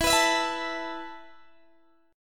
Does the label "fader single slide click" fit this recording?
No